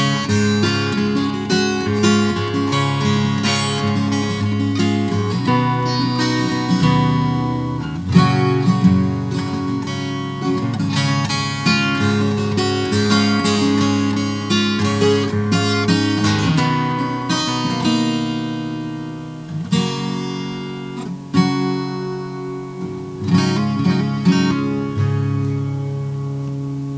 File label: Yamaha Acoustic